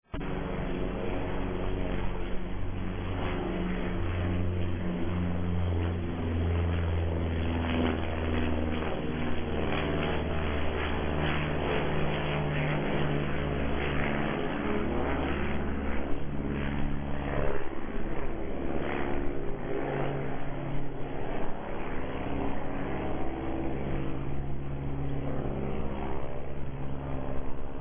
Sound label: Piston Aircraft